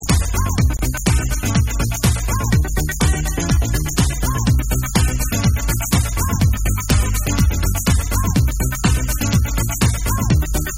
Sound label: Hip House Mix